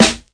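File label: classic hip hop Snare; Classic Hip Hop Mega Kit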